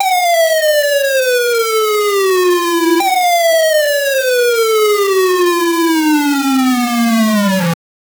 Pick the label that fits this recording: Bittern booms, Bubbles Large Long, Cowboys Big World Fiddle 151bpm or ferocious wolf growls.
ferocious wolf growls